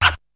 Scratch; ZERO G - Datafile DISC 3

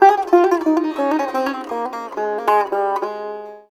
SITAR; Spectrasonics; Heart of Asia

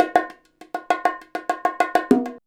BONG, BONGO 100, Modern Percussion Loops

